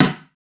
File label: wood close; wood metal SFX